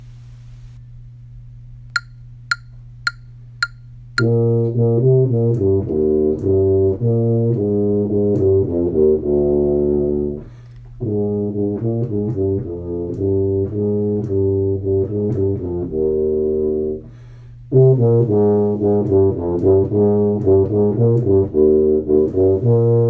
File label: tuba set